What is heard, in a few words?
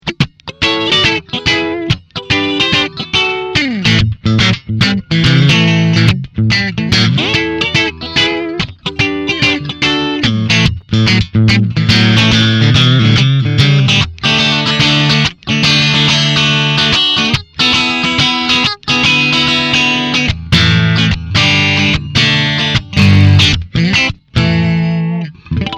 Dry Sixties